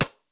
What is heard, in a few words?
WOOD